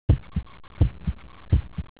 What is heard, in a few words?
heart; oblivion; Realistic Fatigue